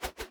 fast whoosh

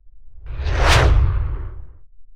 Epic Swishes; SwooshSounds-2

